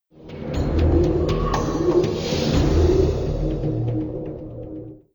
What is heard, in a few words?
Windows Shutdown; Windows Titan; Windows Never Released Soundpack 2; deviantart